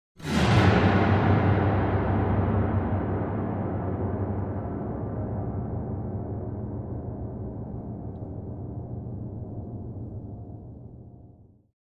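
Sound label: Grand Piano Strings Deep; keyboards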